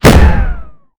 arcade

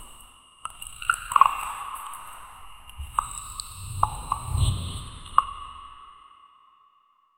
gentle cave ambiance with; stealthy

